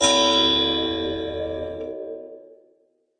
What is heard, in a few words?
one spooky clock gong; Weird and Interesting Hits